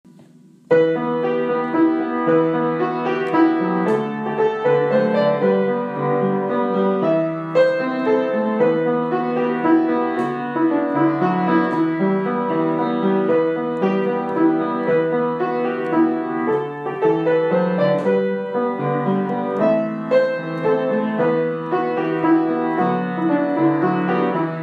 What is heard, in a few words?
Piano, slangpolska; Ninas